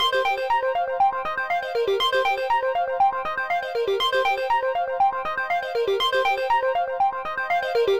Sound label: Synt; SYNTH; 120 BPM